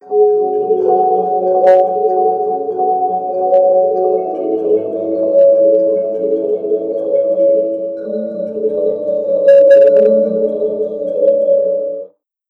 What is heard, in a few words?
kalimba